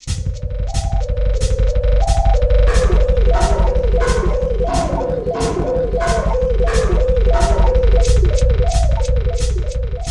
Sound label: Carillon